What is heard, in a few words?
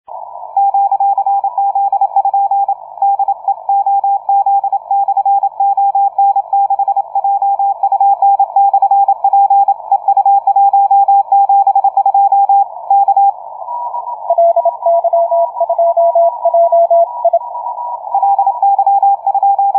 CW 30m